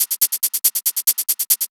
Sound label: Loop 140 BPM; vengeance essential club sounds-3